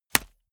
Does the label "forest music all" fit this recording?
No